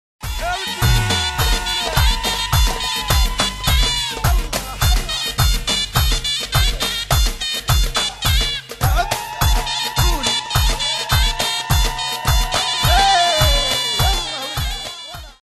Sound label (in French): Maghreb